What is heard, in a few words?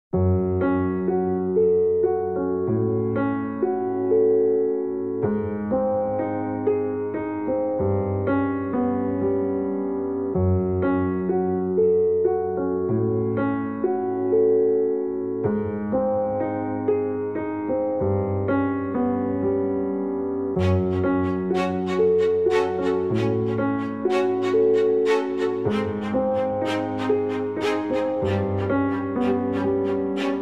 beat